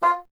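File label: wind bassoon F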